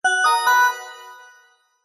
multimedia alert notification bright organ bell like musical positive